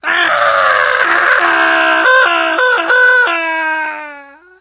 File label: fall, survivor voice